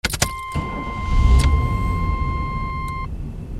CARS

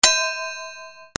BELLS

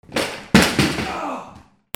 FALLING

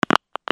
PHONES

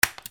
OFFICE

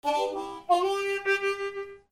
MUSICAL

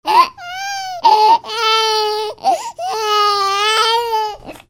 PEOPLE

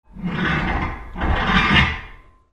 FRICTION

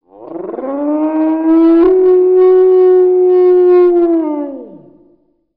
ANIMALS

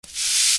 HOUSE

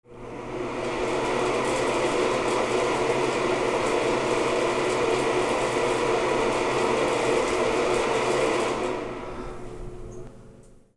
BATHROOM

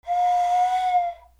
WHISTLES

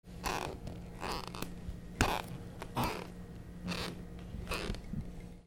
SQUEAKS

